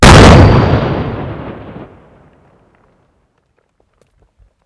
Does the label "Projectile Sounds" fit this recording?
Yes